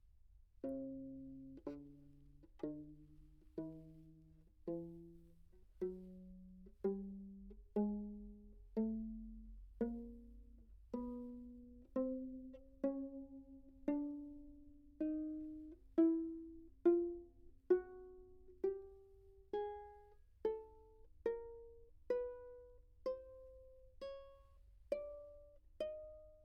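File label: treble viol back microphone pizz chromatic, Anechoic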